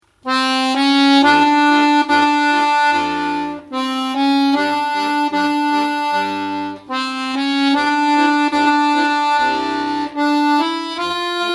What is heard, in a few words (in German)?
Moritat vom geklauten Leierkasten Akkordeonstueck